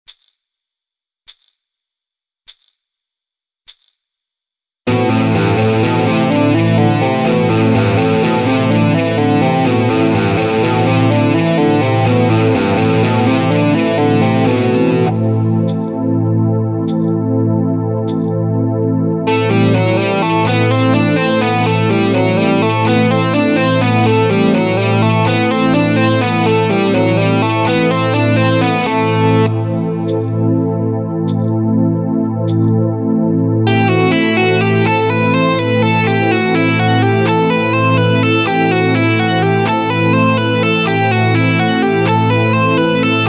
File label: Metal Legato